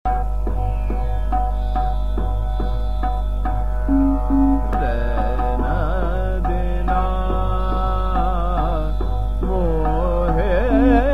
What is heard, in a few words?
Chota Khyal, Trital; Maru Bihag; Raina Dina Mohe